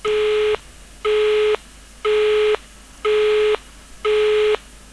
slovenia busy, telephone

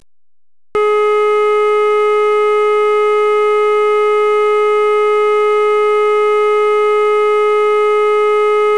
russian dialtone

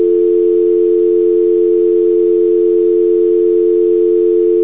dial tone

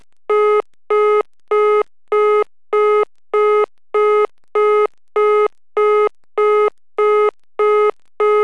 russian busy, telephone

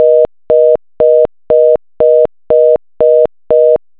reorder, telephone

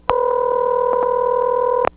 Chat Ring

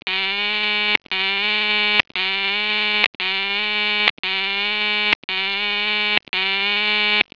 busy

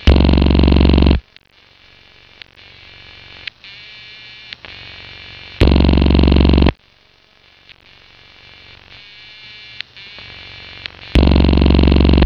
ringback, telephone

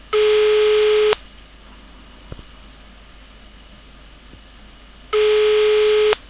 slovenia ring, telephone

